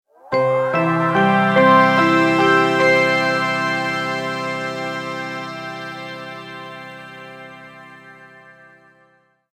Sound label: multimedia logo, Musical Identity/Signature